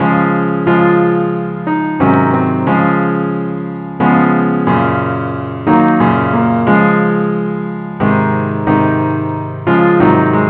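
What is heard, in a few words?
simple accompaniment key D flat; i need thee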